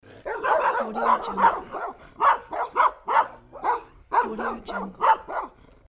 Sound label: dogs barking